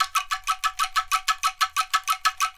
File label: ANKLUNG; ILIO; Synclavier Percussion 2; World & Orchestral